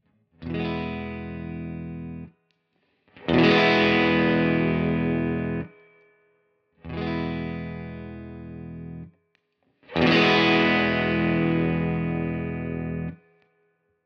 Tone Tweaker Boost 17 Uhr maximal; Carl Martin

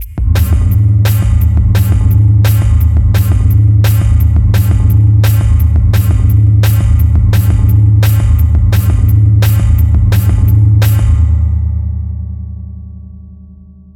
Gong Beat nur Gong; AudioThing Hainbach Bundle

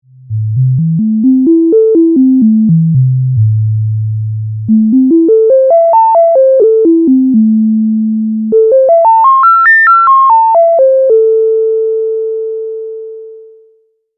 Selbstoszillation; Grandmother

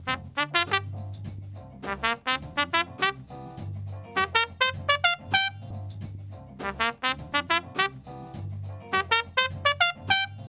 Kompressor Vergleich Aixdsp Intuition Compressor

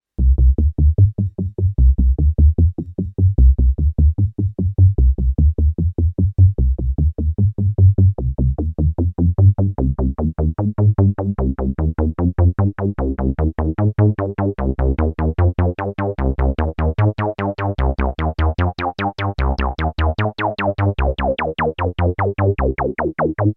Analog Solutions Ample Bass Sequenz Midi Trigger

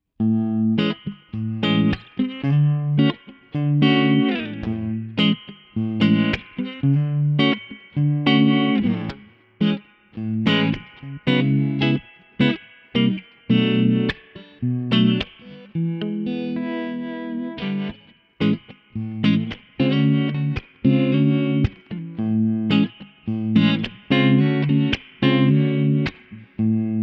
Crazy Tube Circuits White Whale Effektgeraet Trem Harmonic Soft Rev Medium

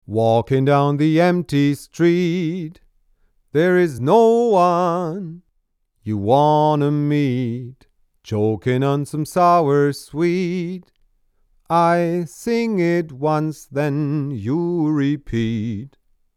Bock 251 Male Vocal V376a Acht 25 cm; Universal Audio

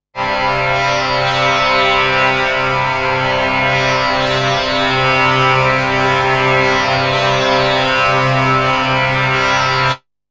Granular synthese Sample; Emu EIV classic und Ultra

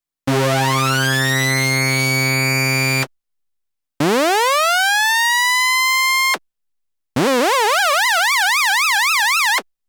Behringer K-2 MK 2 Sync Sound Vergleich

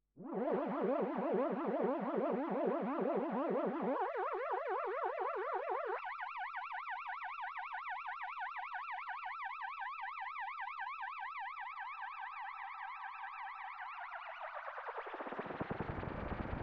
MOOG MATRIARCH Modulationen; Orion

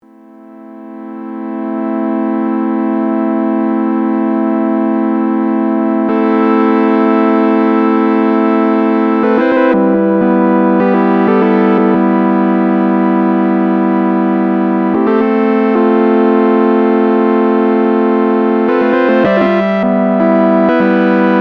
Paraphonisch

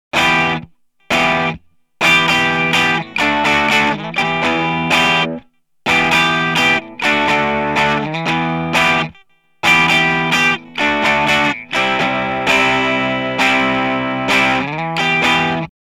Boss ME 90 Direkt Diamond

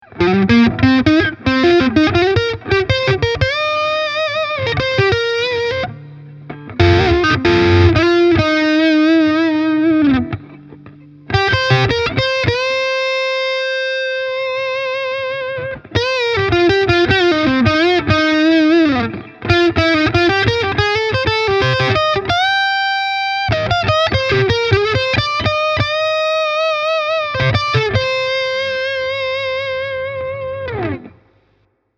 Harley Benton SC Custom III Active Hals verzerrt

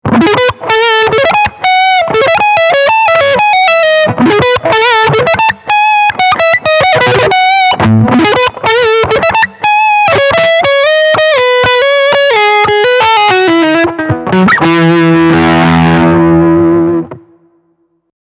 Crunch Picking beide HB

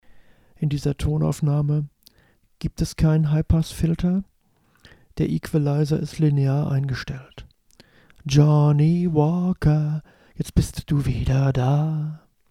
Akustik Duo; selbst abmischen

